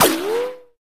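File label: ricochet, guns hits; Weapons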